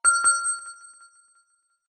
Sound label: glass pling, ringtone